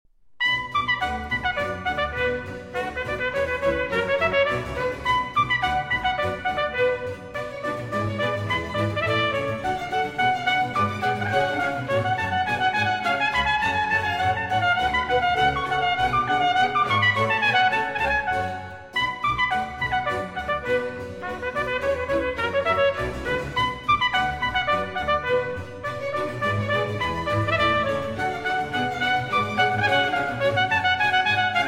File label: Orchestral Suite, B Minor, Badinerie, Trump; Bach, JS; BWV 1067 VII.; Version with Trumpet